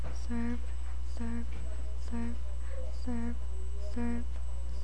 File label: surf